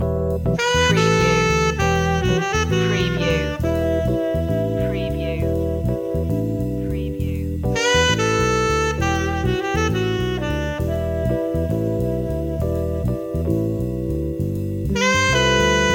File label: groove loop